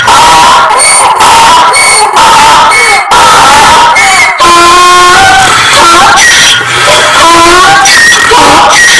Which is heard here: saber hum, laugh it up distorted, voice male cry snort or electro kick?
laugh it up distorted